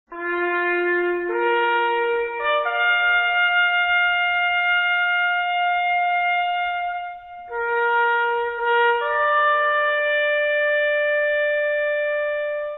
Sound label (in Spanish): Silencio y acostarse Corneta